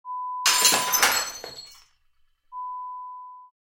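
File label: Plate smash; Lo-Fi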